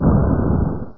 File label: pet Impact